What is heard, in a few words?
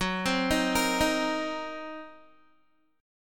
Gb 6th Flat 5th Gb down Guitar; Listen to Gb6b5 arpeggiated